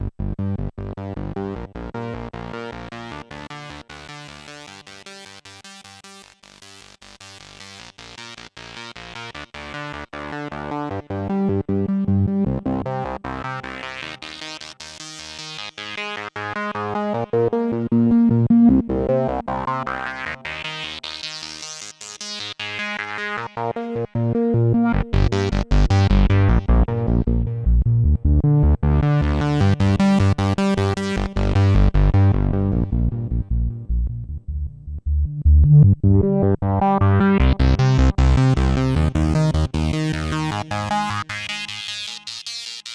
Groove Synthesis 3rd Sawtooth and State Variable Filter